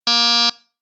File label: door buzzer; TOS